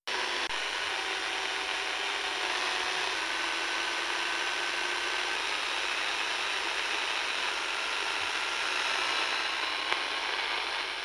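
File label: Radio long wave Static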